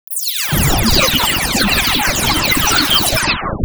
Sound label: sound design Heavy Artillery Short; base pack